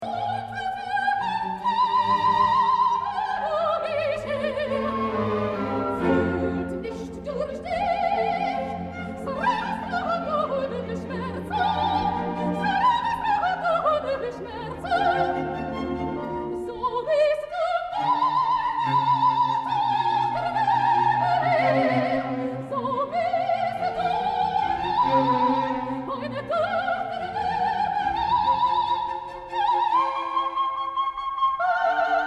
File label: coloratura